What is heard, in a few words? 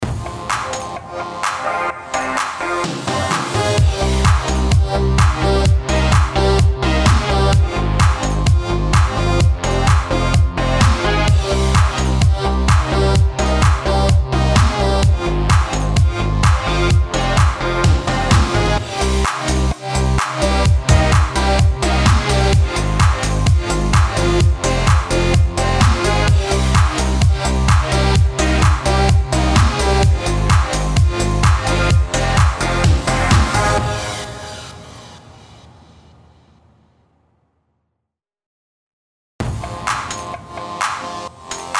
BED DANCE EDM CMIN 128BPM